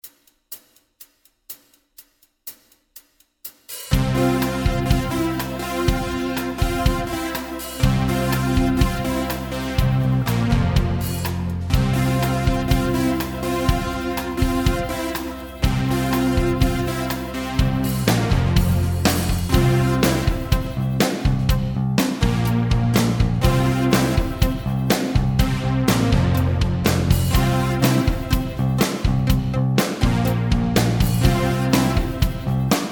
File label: Rock backing With High Harmony, Guitar; Guitar Techniques 2017 Issue 272 August